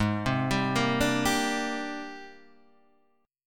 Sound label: G sharp Major Sharp 11th, Guitar Half Step Down